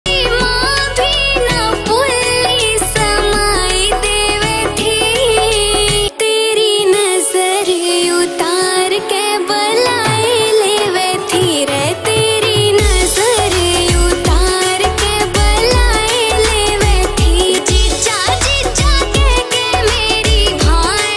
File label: haryanvi ringtone